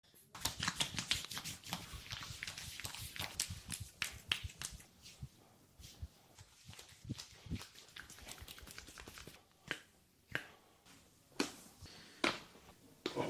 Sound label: Massage